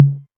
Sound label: 808 Tom Mid; Novation Drumstation V1